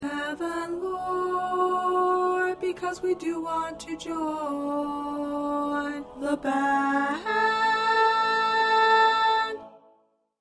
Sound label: Tenor 1 A; TTBB